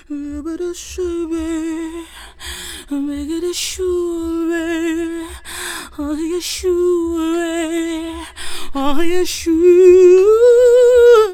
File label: FEM, Hip Hop; E-MU Formula 4000 Series Vol. 1; Hip Hop Nation; Phatt Phemmes 2